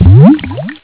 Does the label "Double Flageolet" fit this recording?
No